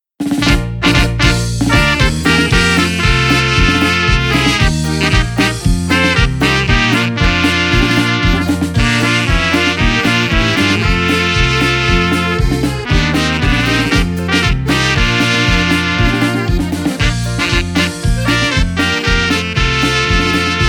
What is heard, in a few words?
Green Garden Polka